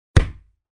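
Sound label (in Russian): удар по мячу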